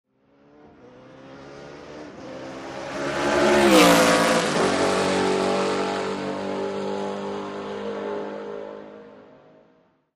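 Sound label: Sports Car Close Up By Fast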